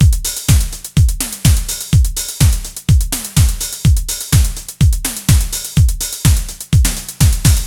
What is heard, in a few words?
125 Neo-Wave Drum, Fast Tempo; Pop Rock; Drums; Digidesign; Pro Tools; Pro Tools Essential Loops